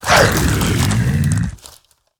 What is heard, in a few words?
Treant, Attack